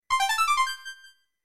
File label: laser pickup; games; ringtones